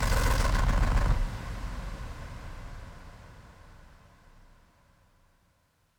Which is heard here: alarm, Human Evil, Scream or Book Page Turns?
Scream